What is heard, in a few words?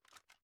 ar inspect, weapons; viper